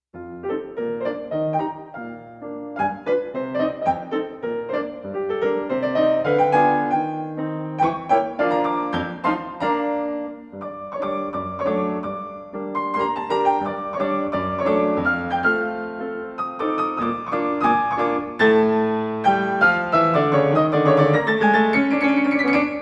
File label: Mazurka